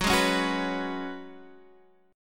F sharp Suspended 2nd Suspended 4th, F sharp, guitar chord; F#sus2sus4 Chord {X 9 6 6 7 7} strummed